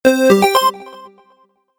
Stage Level Complete, Video Game Sound Effects

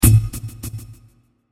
Kettledrum Impact With High Delays; Blasts; Impacts; Crafted Signals

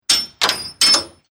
Chain Metal Clanging

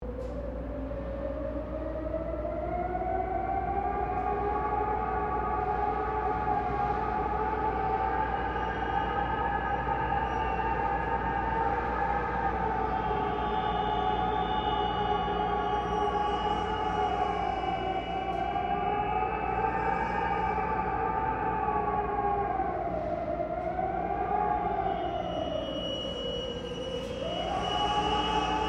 Wind And Resonance In Apartment Building; Weather

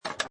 Pinball Sound Ball Move And Hit Metal Object

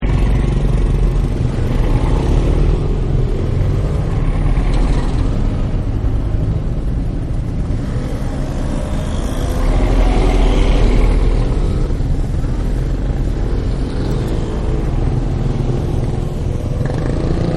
Traffic Asia; Partners In Rhyme

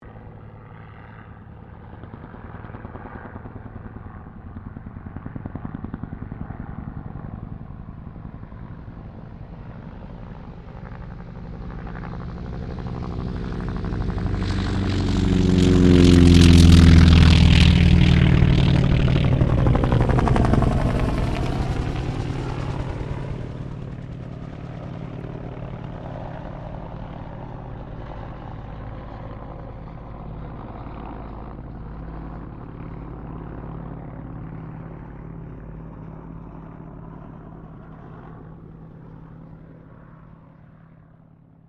AIRCRAFT T-28D TROJAN TAKEOFF UH1 PASS; Airplanes

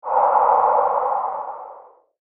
Graveyard Haunting Breath; Ghost Sound Effects; horror sound effect; Andrew Fleming

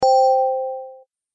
Tap Play, button; multimedia

